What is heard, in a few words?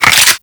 Lever Action Rifle Reload B; Grineer